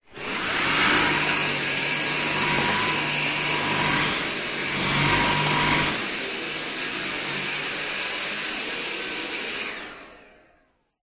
household vacuum cleaner vacuuming around microphone; zapsplat